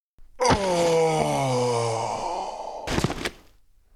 Archer die

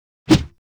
Punch; Weapon

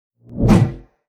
Beam Mace; Weapon

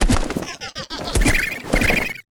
THORNASH WAR ATTACK

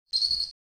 Peace idle; Qurian; Mob

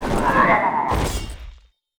WAR DIE

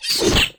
Hopper Attack

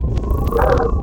wing power

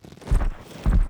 PEACE WALK

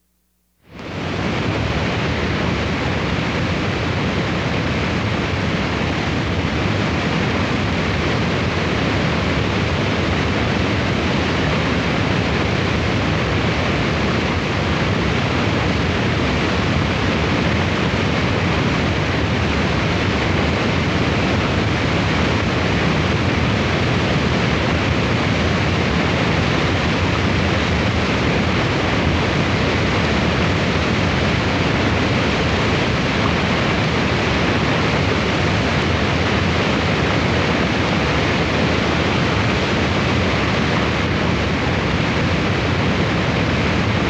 Falls, ambience

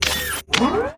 PEACE WALK; ARTHROP; Mob

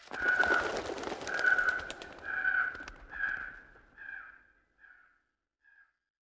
Bird, ambience

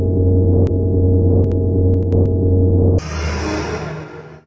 sprint

